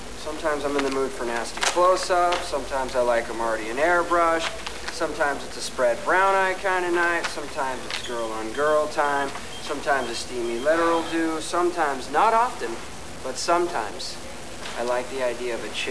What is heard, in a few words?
horse